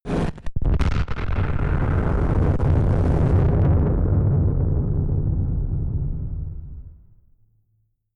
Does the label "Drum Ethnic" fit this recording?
No